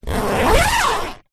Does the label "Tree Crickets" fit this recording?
No